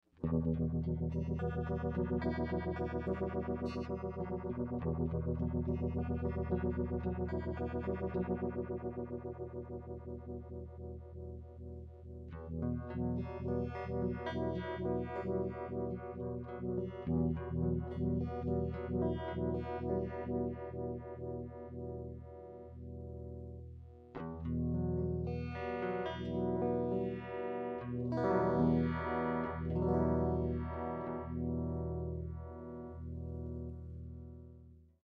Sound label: mono phasor sine